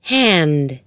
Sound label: HAND